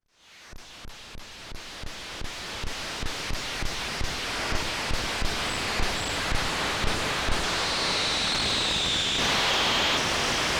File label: a noise of sound